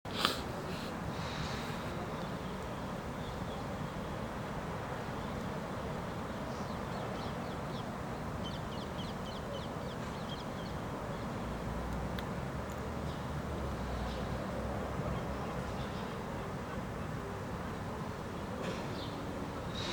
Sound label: ataturk sirens